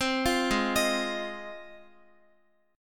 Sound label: Ab 6th Ab, Guitar; x,x,10,10,8,10; Ab6 arpeggiated